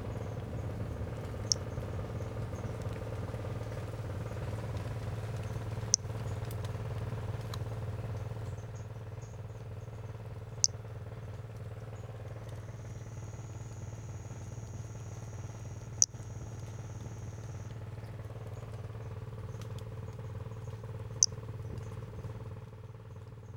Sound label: Brachycephalus albolineatus